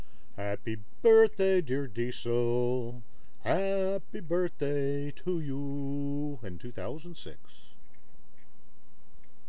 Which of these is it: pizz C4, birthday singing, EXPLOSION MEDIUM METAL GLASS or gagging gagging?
birthday singing